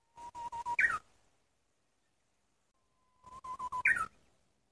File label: uguisu; aso